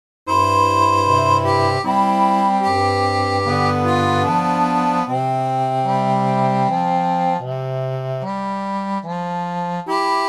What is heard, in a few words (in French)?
orgue barbarie